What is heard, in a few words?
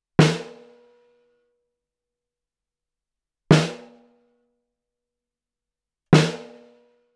Snare - R121 BiDirect; Royer Labs R121; bidirectional (osmička)